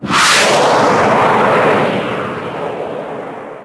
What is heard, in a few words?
Rocket Blast; kids-tetris; Lab